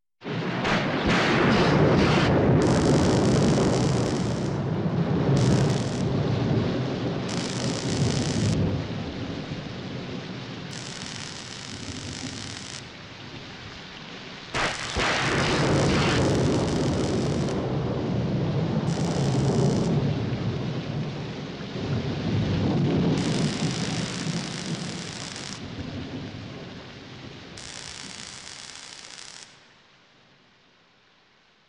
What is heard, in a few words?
Lightning with Sparks, Scary Sounds; 1001 Sound Effects; Halloween Horror Sounds Effects